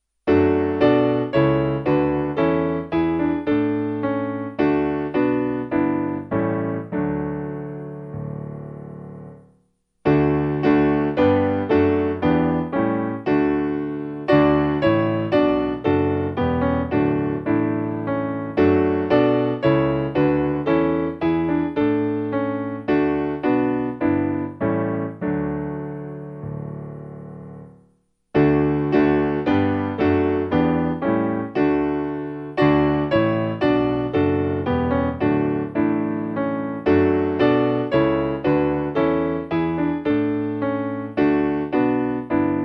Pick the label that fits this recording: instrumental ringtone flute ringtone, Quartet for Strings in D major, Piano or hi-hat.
Piano